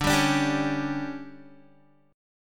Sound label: D Major 7th Suspended 4th, Guitar